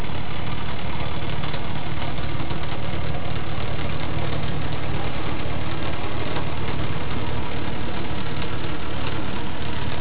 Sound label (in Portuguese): Gravação Arrastão e Traineira